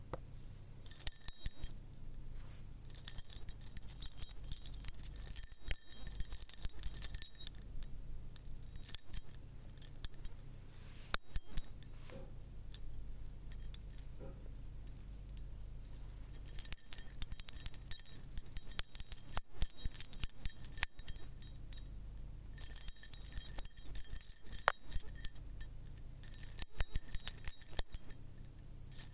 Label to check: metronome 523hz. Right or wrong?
Wrong